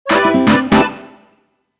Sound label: Jingle Shop; culdcept revolt original soundtrack dynamix 2017